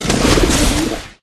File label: Pigeon; Pet